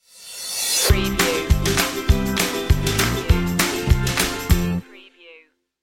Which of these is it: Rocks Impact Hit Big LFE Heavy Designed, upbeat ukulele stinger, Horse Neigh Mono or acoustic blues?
upbeat ukulele stinger